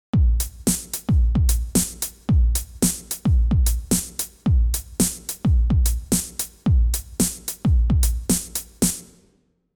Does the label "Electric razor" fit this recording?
No